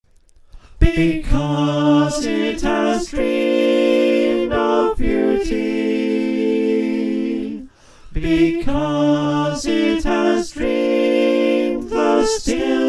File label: up a tone in F SATB